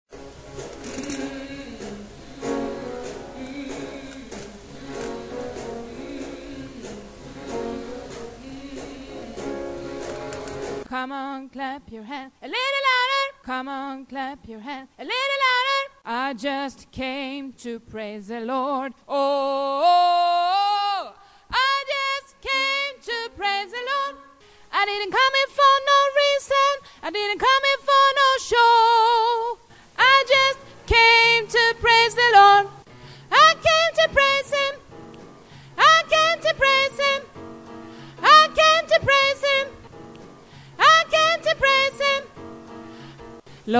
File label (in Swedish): sopran